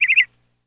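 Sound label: Meadow Lark Happy Chirp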